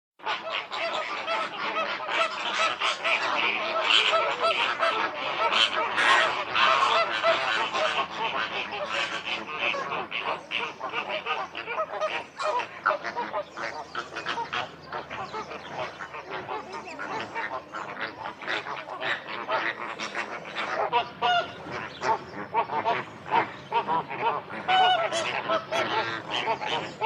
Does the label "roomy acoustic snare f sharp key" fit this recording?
No